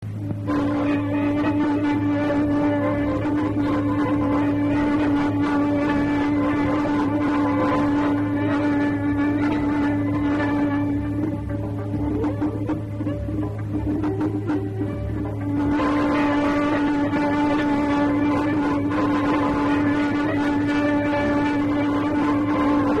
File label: Instrumental